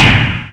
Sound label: END SLAM, percussive